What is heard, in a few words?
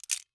Industry Vice Grips Open